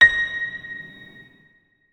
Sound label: notes, keyboard piano; Hideout Furniture